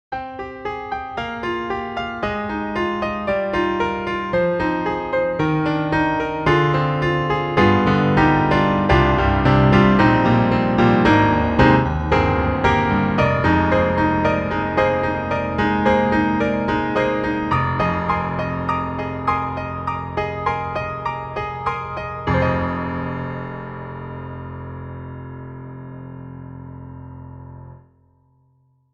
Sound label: Mini Piano (hall); Cakewalk; Cakewalk Sound Center